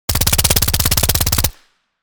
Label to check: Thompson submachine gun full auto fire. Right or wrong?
Right